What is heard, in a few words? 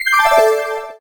CLAMSHELL CLOSE; Sharp AQUOS sense8 SH-M26